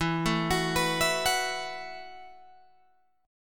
E Suspended 2nd Suspended 4th E, down, Guitar, Open G